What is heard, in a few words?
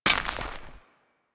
Step Dirt, footsteps